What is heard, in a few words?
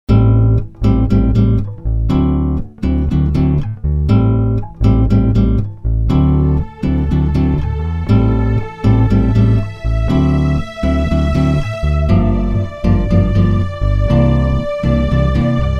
Bossa no Perc 120